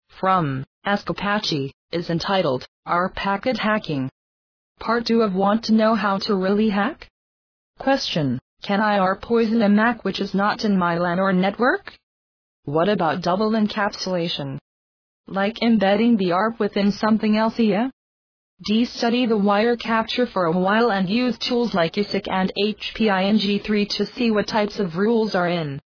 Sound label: arp stuff